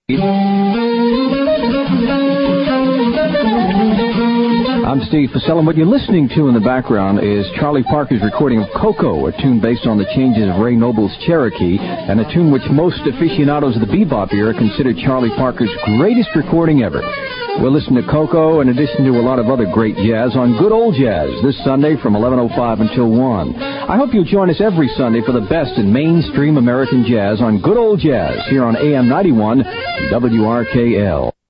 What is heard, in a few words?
jazz